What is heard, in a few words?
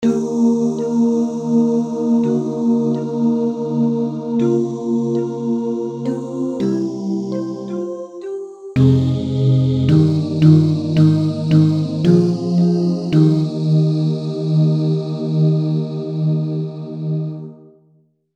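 Baritone, barbershop tags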